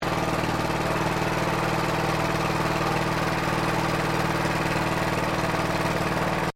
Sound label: ROTARY HOE OPERATION HIGH LOOP, simple petrol driven farm hoe; HOWARD TERRIER 1953; Machinery